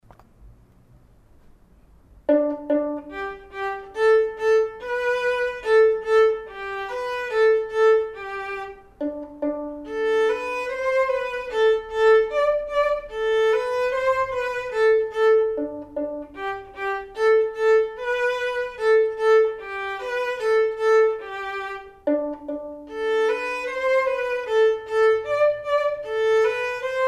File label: perf vln